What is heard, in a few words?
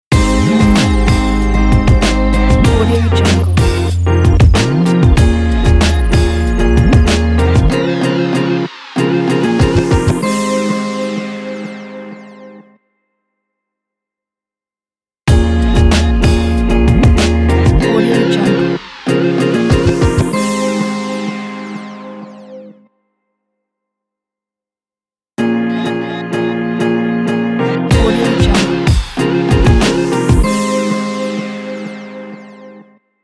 vlog hip hop logo; vsinebeatz